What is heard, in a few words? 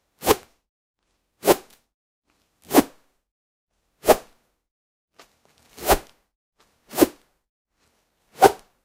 veil slice whoosh torn